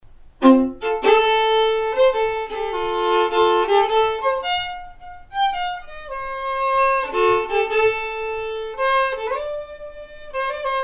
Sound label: Waltz